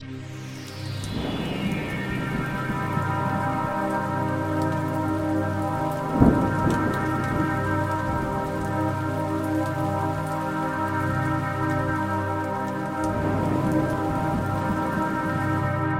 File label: Ripple, pad; ambient; ambient-series